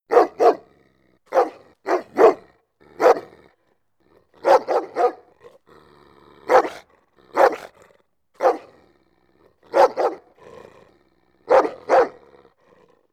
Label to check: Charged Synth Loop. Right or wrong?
Wrong